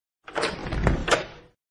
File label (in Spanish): puerta abriendo